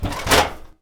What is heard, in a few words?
Wpn Fat Man reload